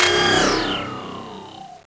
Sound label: DROWN